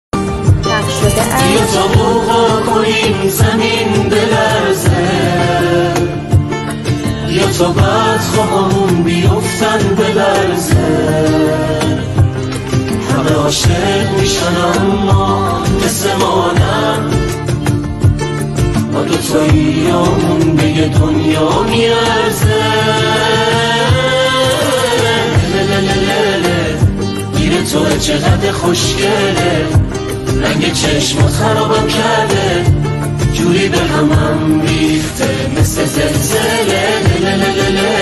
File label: music hamkhani gorohi